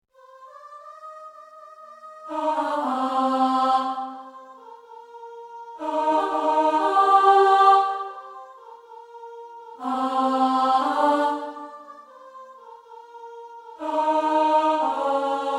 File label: Alto